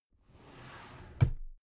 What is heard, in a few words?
drawer cabinet carton close soft halfway